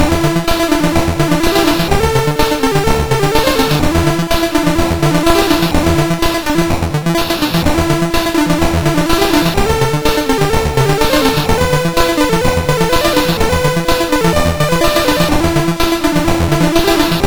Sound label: Synth Sample III, CSG8580R5